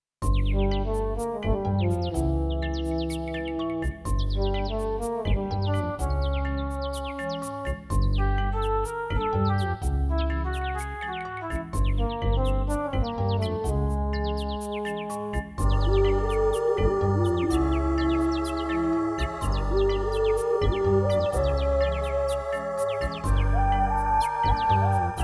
CM500 GS; Larry 3 passionate patti in pursuit of the pulsating pectorals

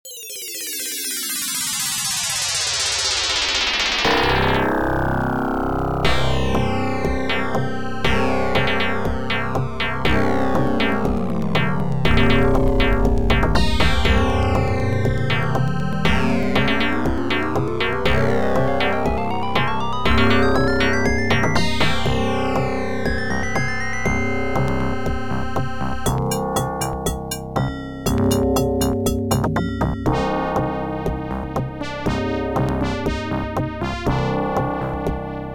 ADLIB OPL2

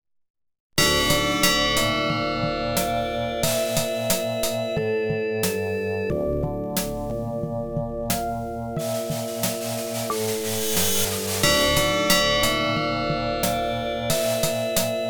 OPL2

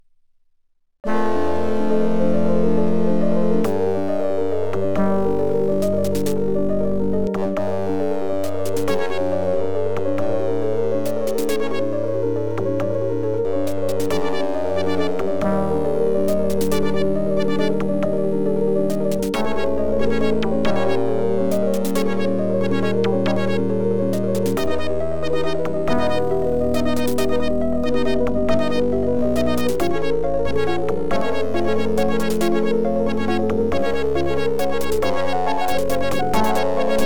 OPL2; X WING

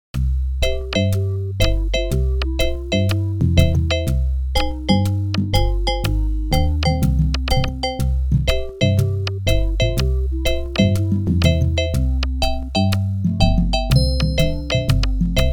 OPL2; Mêlée Island

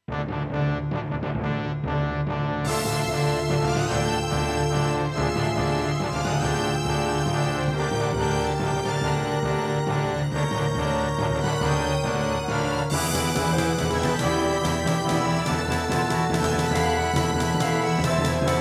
SC880; QFG3

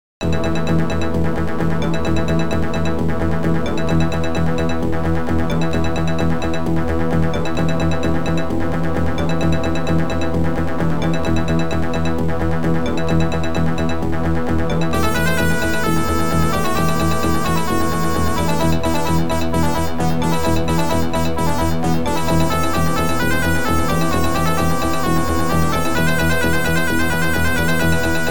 OPL2; Tyrian

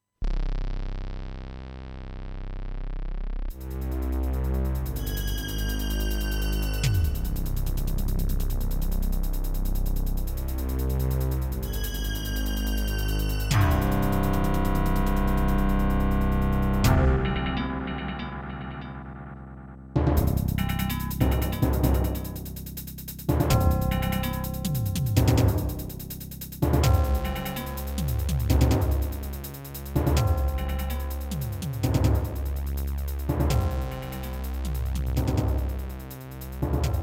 SC880; Dune 2

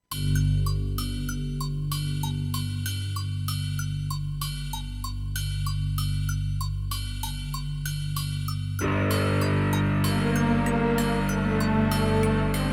SC880; dune 2 the building of a dynasty

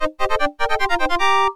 OPL3; Tetris

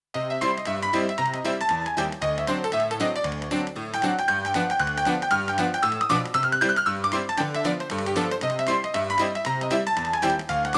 CM500 GS; LSL3

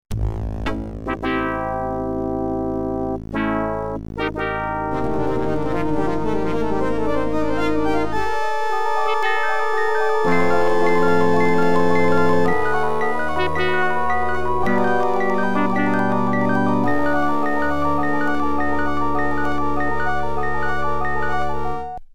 OPL2; X WING

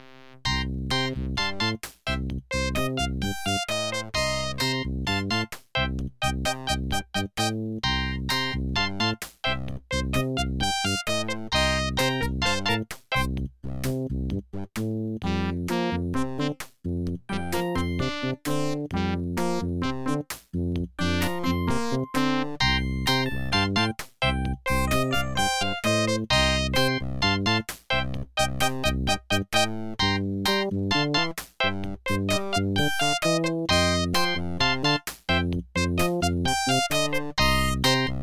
OPL3; sim city 2000

